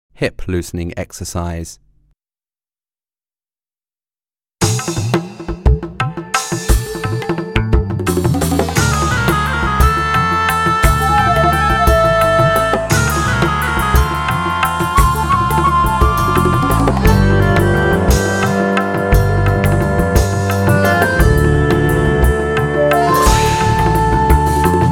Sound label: Hip Loosening Exercise